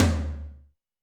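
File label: Tom Ambient Floor, Alesis D4; AmbntFlr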